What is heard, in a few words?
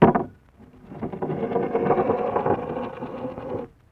Glass On Bar With Slide; Vintage Cartoon; Foley